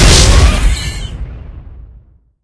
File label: smoker explode, death